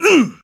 hit; Original TK Sounds

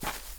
grass step; Golden Crayon Sounds

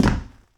ceramic land; Golden Crayon Sounds

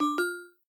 Turn Right, iOS Sounds

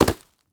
grass land; Golden Crayon Sounds

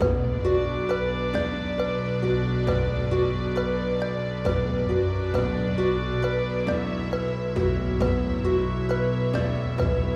Dungeon; Timecrest Music

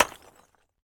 wall brick; Golden Crayon Sounds

